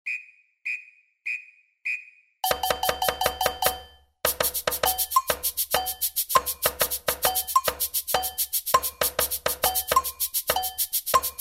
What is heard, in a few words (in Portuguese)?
tamborim agogo